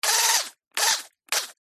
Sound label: industrial power tool small drill etc burst